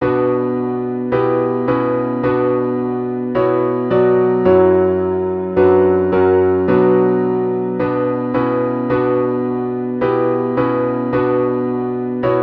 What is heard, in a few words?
for oboe piano; Schubert, Franz Peter; der tod und das madchen